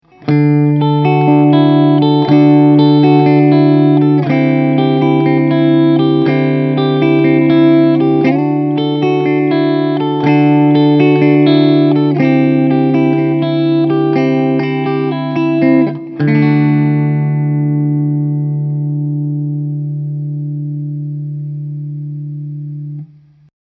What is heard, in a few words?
VOX Mini Super Beetle clean hall